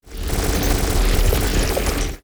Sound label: granulized, glitch